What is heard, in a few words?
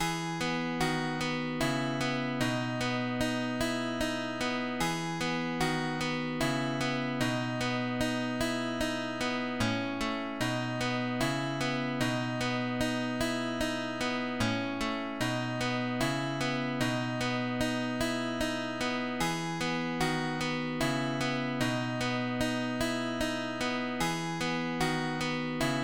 guitar; FF7DEMO